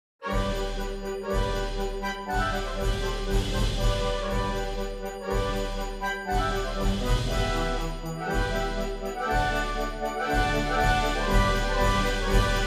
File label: Turkish March - Orchestra